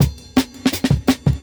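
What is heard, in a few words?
Jungle Breaks, 167 bpm; 90s Sample CDs; Original Jungle Breaks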